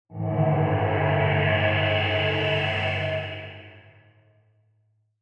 Whoosh Transition Sci Fi Musical, Whoosh Transition Musical Magical Transformation; Whooshes; Sound Design; BBFX